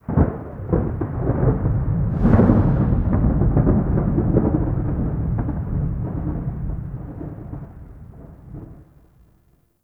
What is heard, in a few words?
Narmer Outpost Thunder; Warframe; The New War; Ambience